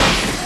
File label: Perc Hit, drums; One Shots; Drums & Drones